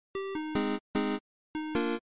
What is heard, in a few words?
8 bit shine short